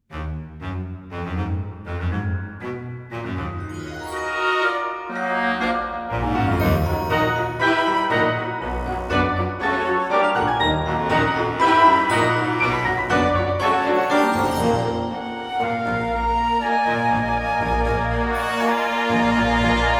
Woodart Morewoods

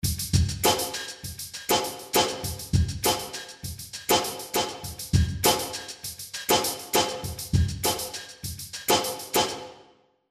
Drum Room Small Warm; DR008 fxreverb

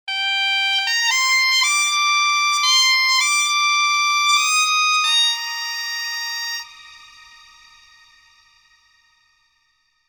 Guan Sustain Legato Reverb; Phoenix Orchestra; Valhalla

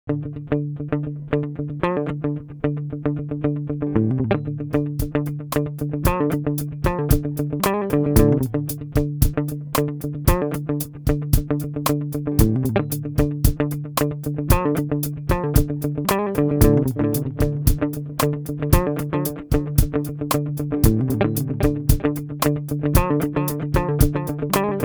Tempo Delay Pop Rhythm

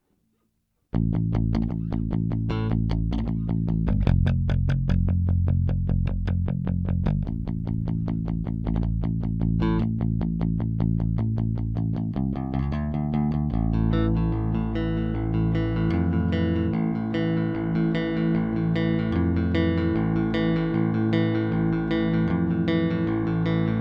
Both full pick

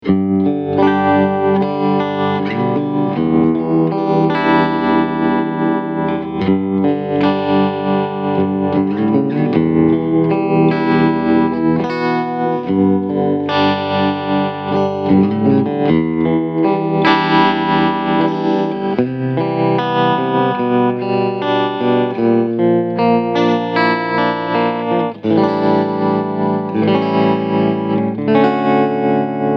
Medium Speed

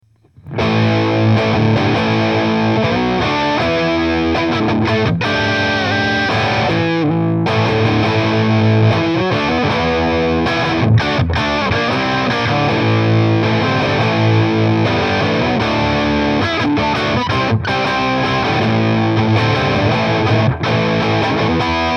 70s British

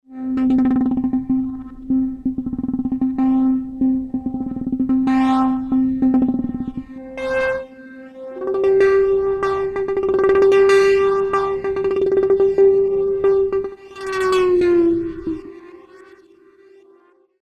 Hydrasynth

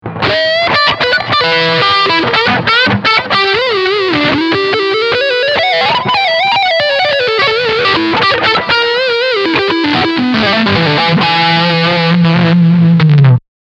Lead Line Front HB

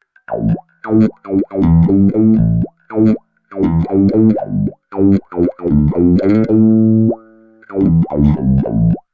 Waldorf 2 Pole Bass Env Follower

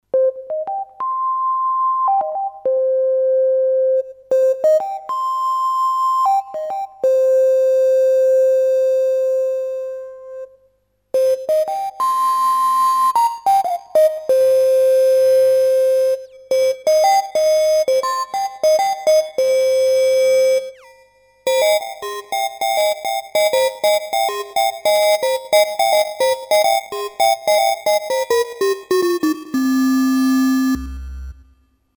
Teenage Engineering TX 6 Crunch

